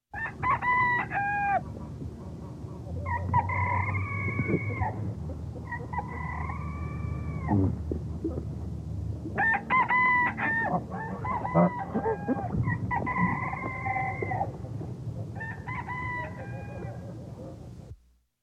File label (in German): bantamhahn, Tierstimmen